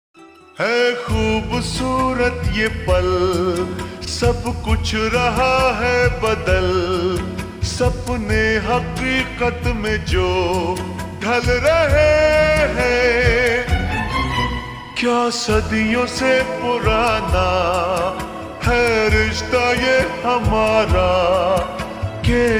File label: Ringtone slowed and reverb